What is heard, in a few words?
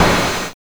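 open hi-hat, trash kit; USB Soundscan vol.11; Drums Dance & Groove; AKAI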